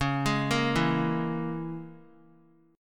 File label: C sharp Minor 6th; C#m6; arpeggiated; JGuitar